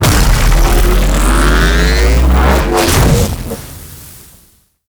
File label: Void Dragon Body Ring Cast; Enemies; Abilities; Warframe; Duviri